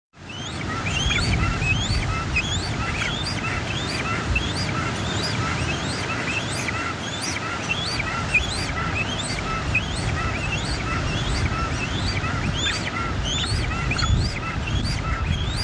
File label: impef shag